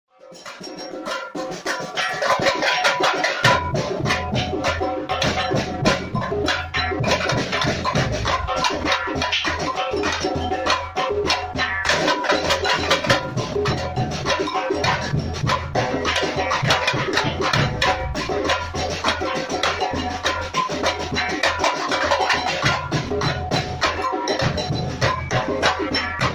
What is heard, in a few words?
Afoxe